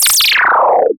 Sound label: anime sci fi laser beam